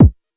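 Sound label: Club bass drum, Jomox Airbase99, Drum Machines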